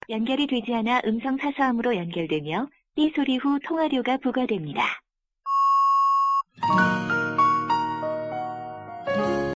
Korean Phone

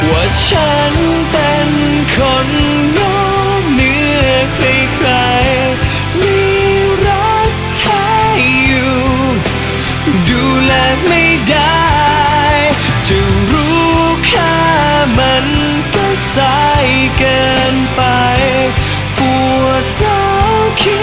Thai

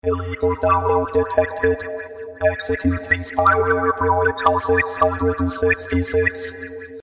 Robot

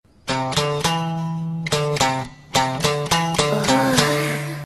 Funny Solo

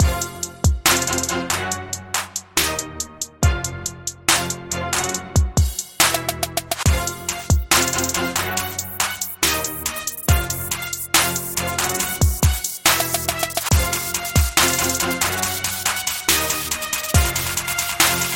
Rap Beat

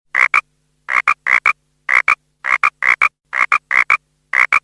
Frog 3d Sms

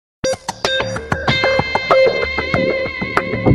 Guitar Sms

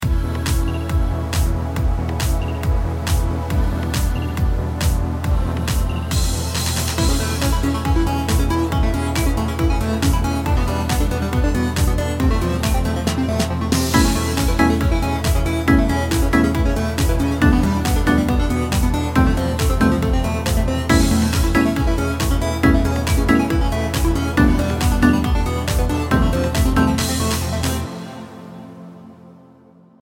relaxing electropop

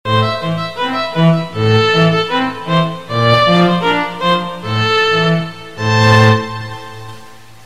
Relaxing Tone Sms

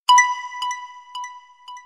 Blob Pulse; meloboom

